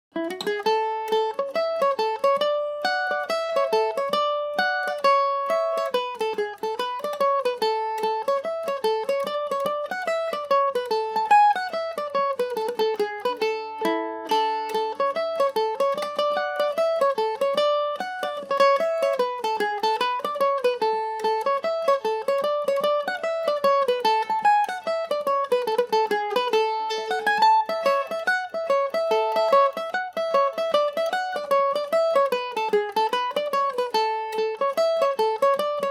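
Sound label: A major, irish mandolin; mccarthys hornpipe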